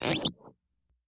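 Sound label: Creaky Squeak Small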